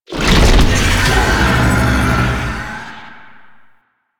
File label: Octopede Doom Stack, Sounds Enemies; Warframe; Man In The Wall